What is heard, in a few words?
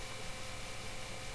motor